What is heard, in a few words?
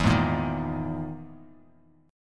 E Major 7th Flat 5th down Bass Standard; EM7b5 strummed; JGuitar